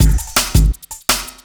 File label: Beat, Futurist Drum & Bass; Drums & Percussion